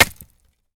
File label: industrial tools axe chop wood; sound effects one